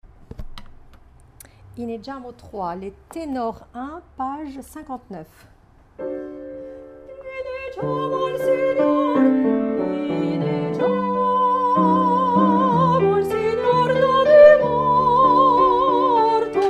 Tenor

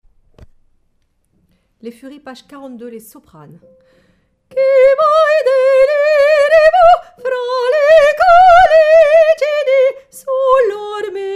Soprano

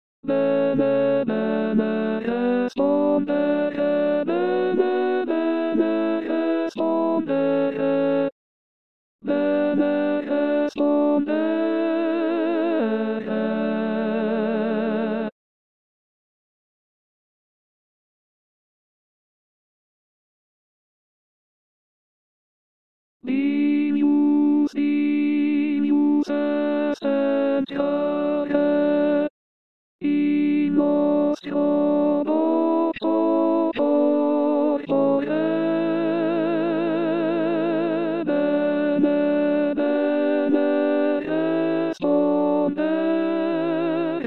Alto; Bene respondere

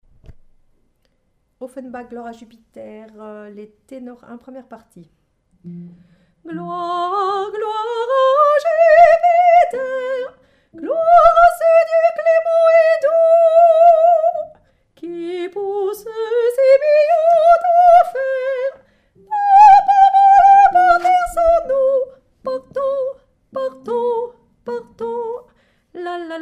Tenor

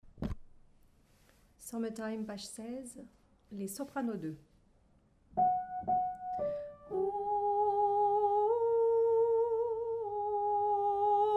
Soprano

